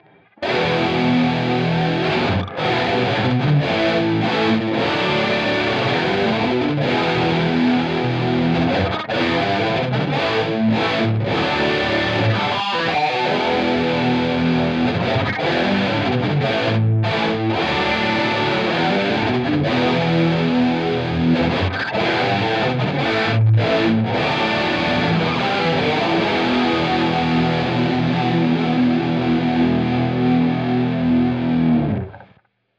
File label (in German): DI Aufnahme Micro Pitch Scuffham Amps S Gear